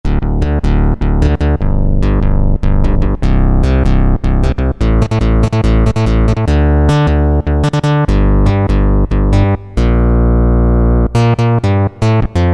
Synth Bass

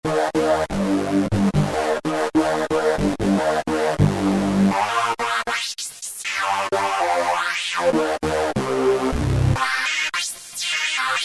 Science Lead, Korg microKORG; Factory Voices

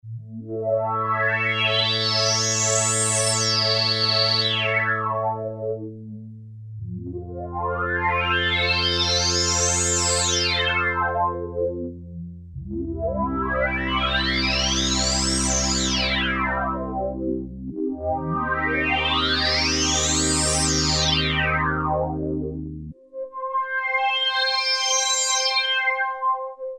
Slow Attack Wah-Wah; Factory Presets Bank B

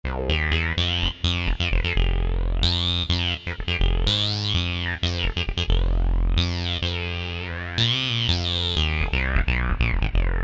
Wow Bass; Generalmusic Equinox

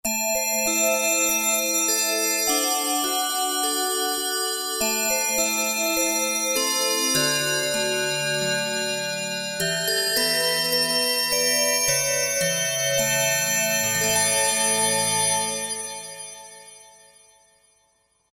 Wave Bells, Roland JD-990; Factory Patches

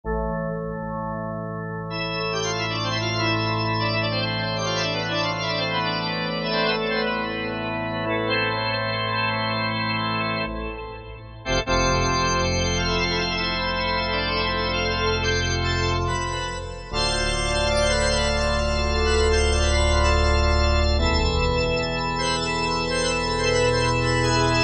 Pipe Organ, Korg M1; Factory preset examples

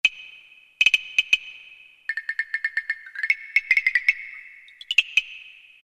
Castanets; Roland MT-32

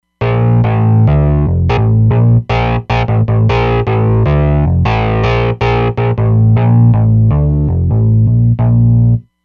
Dirt & Grime, Roland Fantom XR

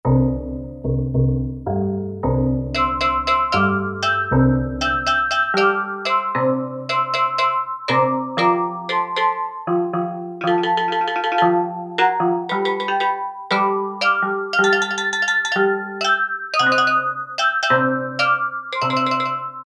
Tiki Threat, E-mu Proteus-1; Presets

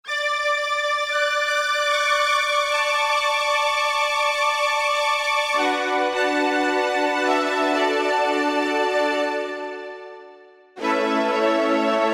Korg MSC-1S Midi Stack; Korg MSC-1S Variety

